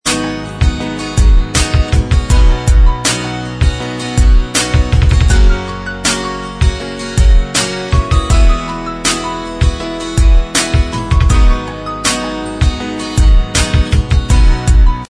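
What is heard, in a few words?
instrumental, backing tracks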